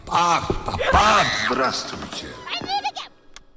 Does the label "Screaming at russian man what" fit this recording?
Yes